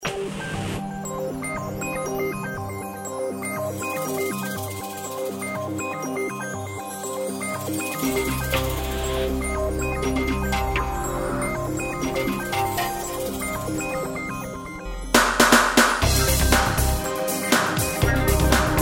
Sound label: chiptune, Genesis; Sonic CD